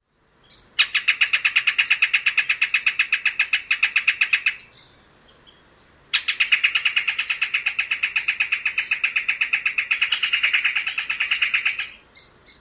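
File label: ringed kingfisher calls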